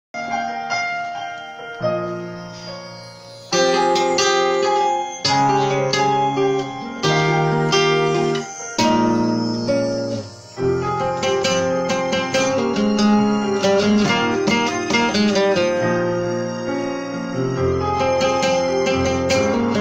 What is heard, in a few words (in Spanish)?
guitarra, Flamenco chill